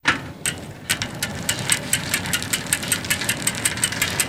Bicycle Chain Clicks

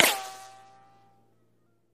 Bullet Ricochet Sin

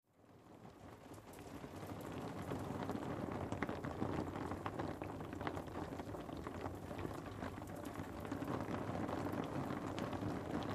Rain On Roof Cu Interi

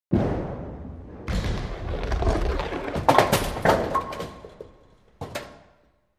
Bowling Ball Rolls

